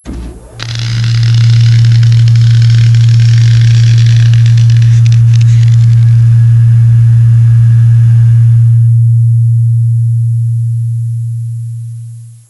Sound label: tesla on